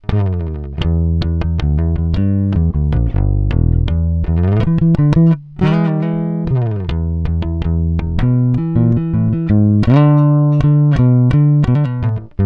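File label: Mustang, guitar; Fender American Performer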